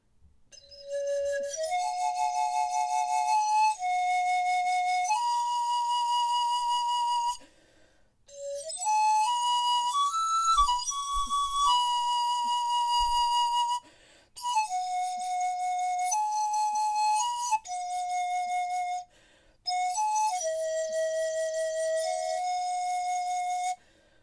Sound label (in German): panfloeten klein